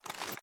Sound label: sm reload empty xmag lift; gmod